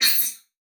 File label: Multimedia Internet CD-Rom Flash Whoosh Short